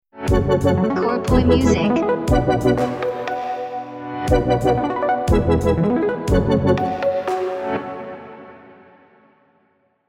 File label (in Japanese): jingle ウォーター